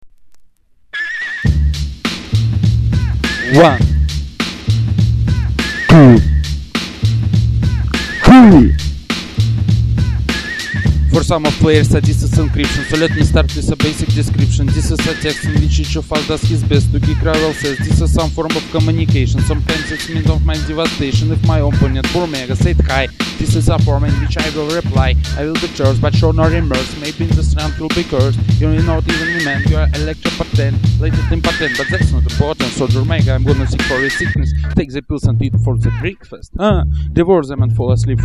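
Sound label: spiritual tribal english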